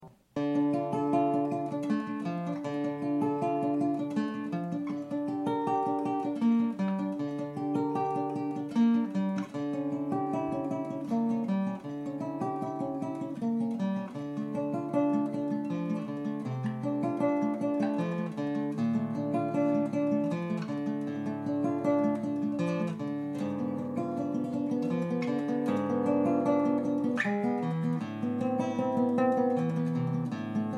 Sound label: Classic Living room recording No sound effects no equalizing, guitars; DAT recording by Silvestre Borras